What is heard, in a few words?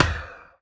Ball Hit Med Breathy; Spinal Serve